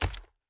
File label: Enemy Clicker Run